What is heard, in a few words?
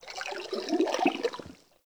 Under City Cavernous Water Lap Rock